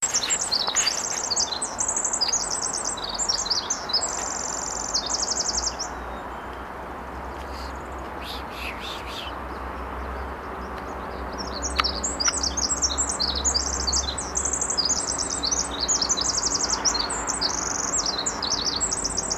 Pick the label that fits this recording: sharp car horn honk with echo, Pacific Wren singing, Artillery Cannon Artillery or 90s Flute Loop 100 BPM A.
Pacific Wren singing